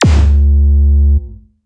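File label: BD Sub, bass drum; Takebeat; Club; VEC1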